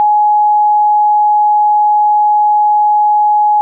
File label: bell sounds